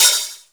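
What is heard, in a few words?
OHH -R, open hi-hat, Drum & Bass; Ueberschall; Drum & Bass Resonance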